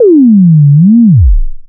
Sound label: SYN FX; X-Static Goldmine 1